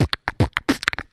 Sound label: Beatbox; Drum loops